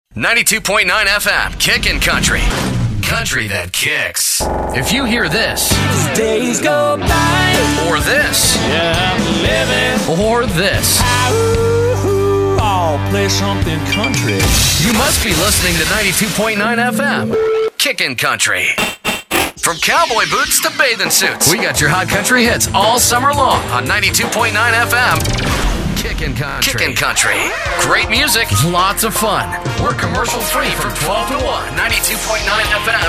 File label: Kickin Country